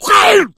hunter shred, attack voice

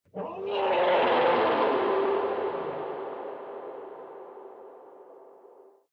animal call yeti, lair; Source Engine; tf

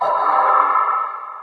inside battle soldier, prison; ambient; source engine; hl2; video games

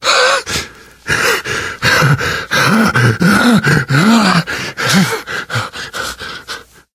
exertion critical, survivor voice; source engine; left 4 dead 2; namvet

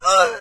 citizen beaten, voices; Source Engine; video game

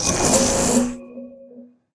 player exit stick paint

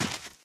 gravel, footsteps, walk

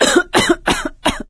cough, survivor voice; source engine; left 4 dead 2; producer

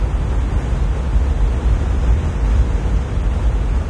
data transmission loop; ambient; source engine; episodic

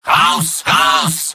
medic battlecry; mvm

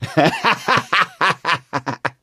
laughter, gambler, survivor voice

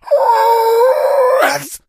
shout, infected

